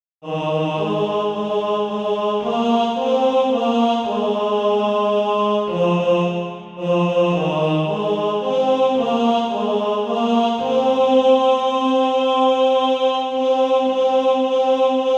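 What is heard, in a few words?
bass, Practice Music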